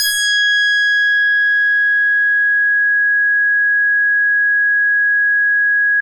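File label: Synthe Bass DX100 G sharp 7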